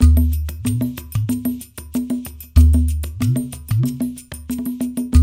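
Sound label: 93 bpm, udu calypso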